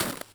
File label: snow step mono; torque3d